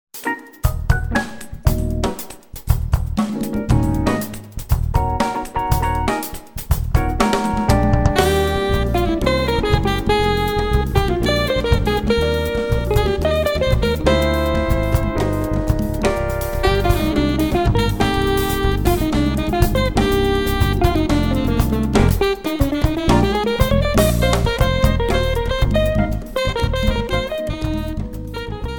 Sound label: samba